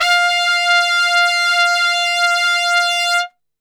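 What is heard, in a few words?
F 3 trumpet straight, Brass; Best Service; Brass Super Section; TRP STRGHT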